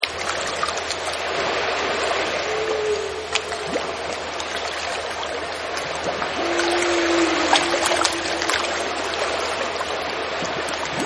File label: Whales Water; Partners In Rhyme